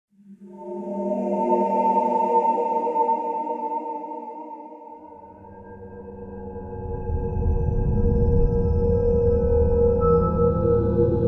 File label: Field BGM; Xenoblade Chronicles 3